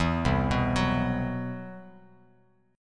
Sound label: C Major Sharp 11th, Bass Standard; CM#11 arpeggiated; JGuitar